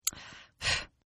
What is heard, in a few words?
Sigh Female; Exhaling; Sound Effects:Voice; Partners In Rhyme